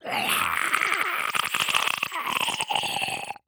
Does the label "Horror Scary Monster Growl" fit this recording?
Yes